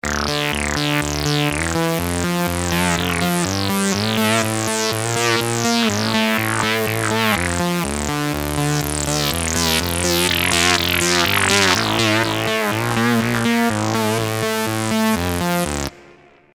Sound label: russian filter fm bass with delay FX